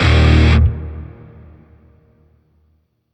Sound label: Shawzin Min Chord B; Music; Warframe; Grineer; Chords